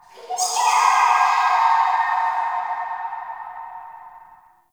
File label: Root Cave Distant Chatter; Ambience; Neathuns Root Cave; One Offs